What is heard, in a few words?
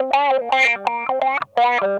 TIMER, Funk Guitar; Zero G - Funk Guitar